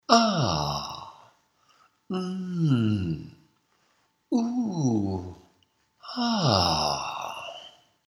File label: ahh